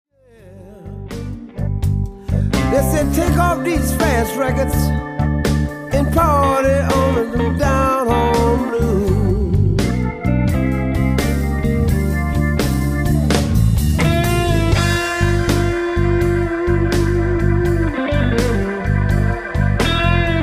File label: solo downhome